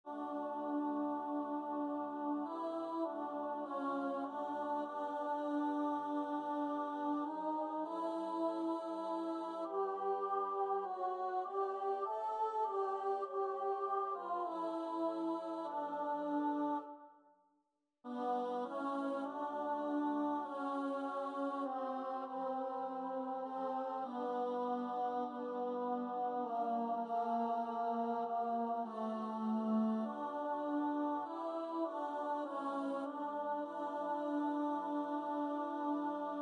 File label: - alto